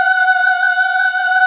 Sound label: GOSP. AAAHH; Sound & Vision - Gigapack II CD 1 (Roland); HAL Gosp. Aaah 1